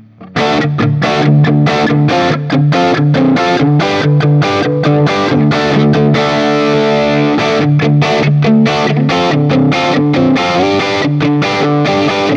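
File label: Guild Bluesbird Holoflake JCM800 D, guitar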